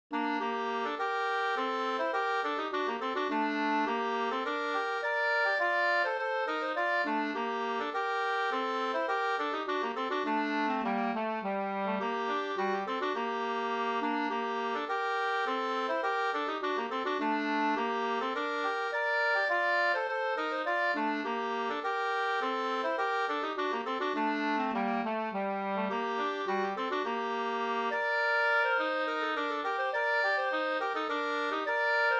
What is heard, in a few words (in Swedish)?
polska Storpolska; Ostjamtland